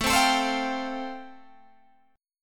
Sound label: A-Minor 7th Sharp 5th, Guitar Open G, chord